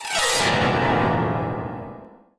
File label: shock